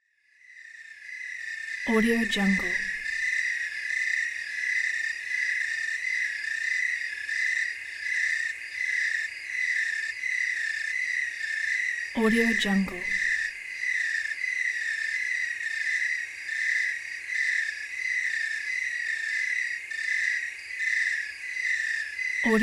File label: night crickets